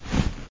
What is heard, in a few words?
Chair